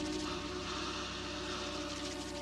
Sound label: Giant Boa Roar